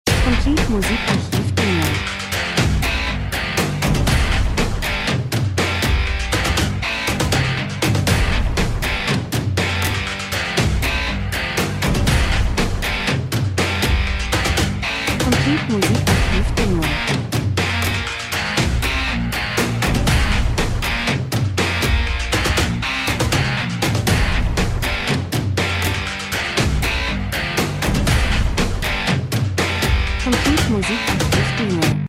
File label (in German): drums, rhythmus; Spannung Krimi hart cool Metal Sport Schweiß Boxen Industrie, Film, TV Schlagzeug Gitarre Bass; Stimmung